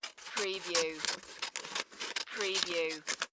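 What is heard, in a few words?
Scissors Cut Construction; Sound Effects Bible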